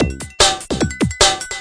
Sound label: 149 tuned so high break; 141 to 160 bpm